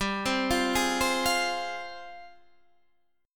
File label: F Minor, chord; Fm/G arpeggiated; JGuitar